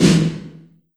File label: LARGE ROOM 909, REVRB; TR 909